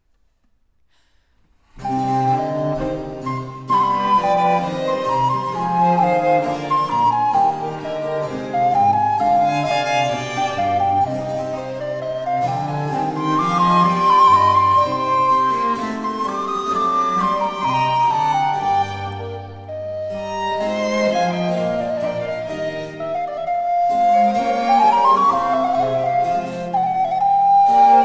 Sonata For Recorder, 2 Violins & Continuo in C Minor, Moderato